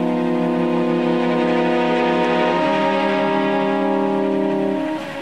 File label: strings, Sad